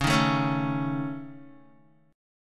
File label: C sharp Suspended 2nd Sharp 5th C sharp Guitar; C#sus2#5; strummed; JGuitar